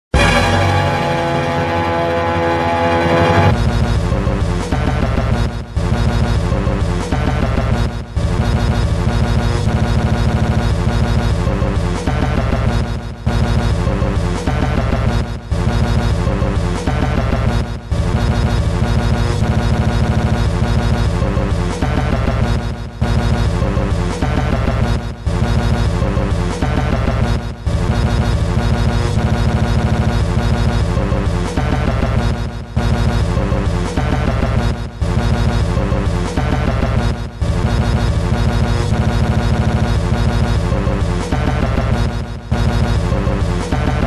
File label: Barnyard Multiball, pinball music; Medieval Madness